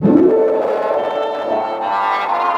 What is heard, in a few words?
Strings, Underground Hip Hop; USB Soundscan vol.02; AKAI